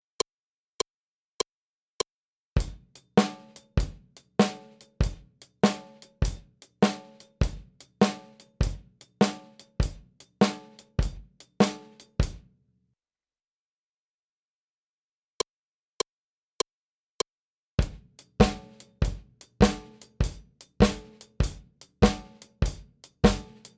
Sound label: Shuffle Rhythms